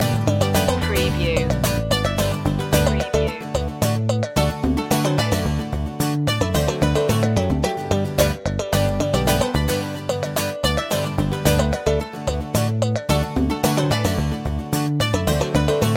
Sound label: morocco souk loop